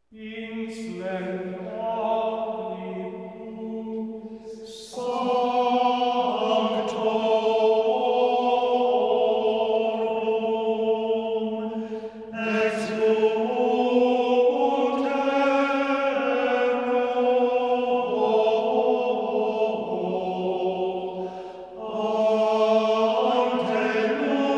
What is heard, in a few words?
Midnight Mass Communion Gregorian Chant; pasodelnorte